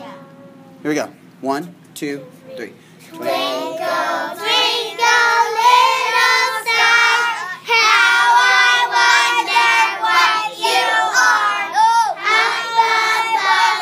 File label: Twinkle; Bumblebees